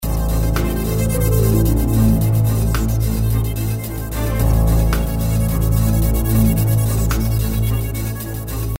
loop

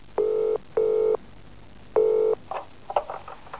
PHONE, 电话; 特酷声音素材库

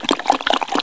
Water, 自然, 水声; 特酷声音素材库

